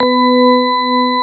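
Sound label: CX3 organ B3 L, Keyboards Old School; USB Soundscan vol.09 - Keyboards Old School; AKAI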